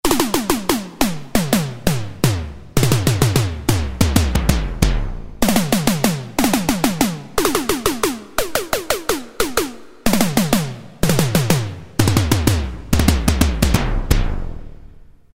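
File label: Electro Drum, Roland Alpha Juno-2; Factory A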